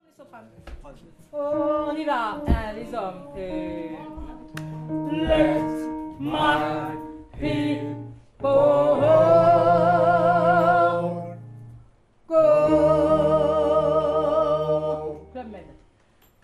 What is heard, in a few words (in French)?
fin homme, gospel